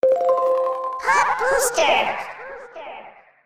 Pot Booster; CosmicCrazyCash; ClubFusion

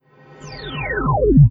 Roulette No win; Classic Roulette

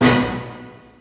Bonus Wheel Symbol; ClubFusion; Showtime Classic

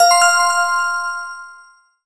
Bonus Sound; Fruit Chutes

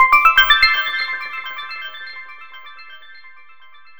Winline; ClubFusion; Super Fruit Chutes

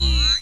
Incorrect; Classic Roulette

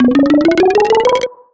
Top Symbolls Rise Slow; Fruit Chutes

